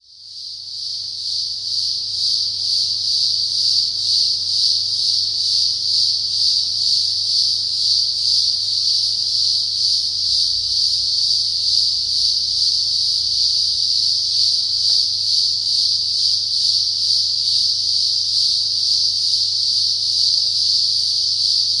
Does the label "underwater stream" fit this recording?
No